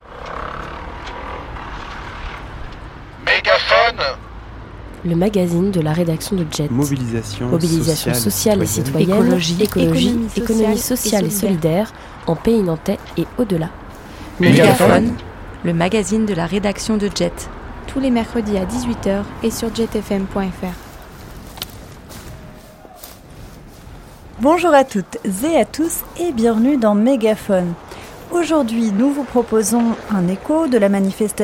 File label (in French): Manif Retraites; Megaphone